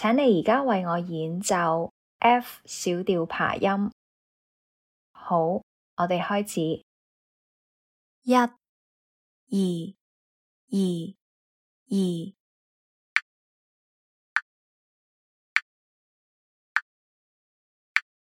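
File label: F minor arpeggio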